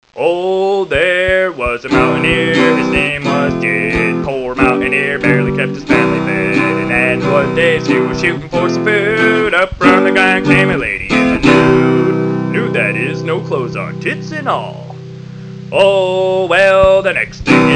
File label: Hillbilly acoustic